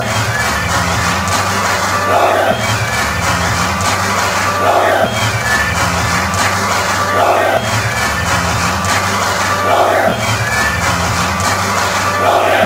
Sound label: clap, fanchants; gnaget